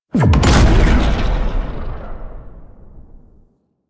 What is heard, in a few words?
Cloak Remove; Warframe; Warframes; Frumentarius